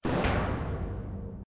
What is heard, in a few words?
Dramatic Hit; Learn to Fly 2; Story Outro